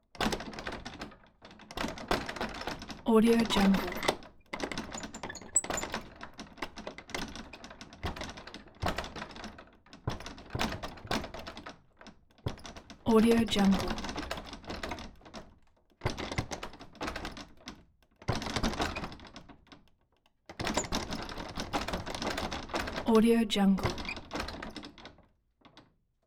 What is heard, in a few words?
barn door big